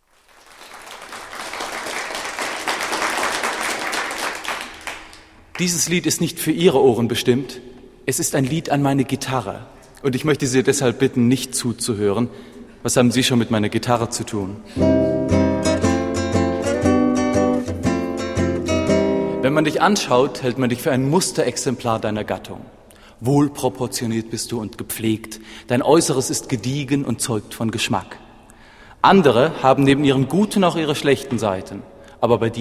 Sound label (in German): Lied an meine Gitarre